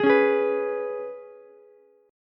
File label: Ab Minor Double Flat 5th Ab down Ukulele; strummed; JGuitar